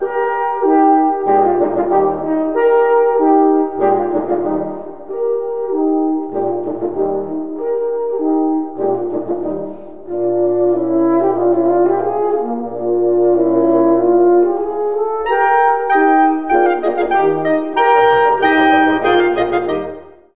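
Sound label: horn chamber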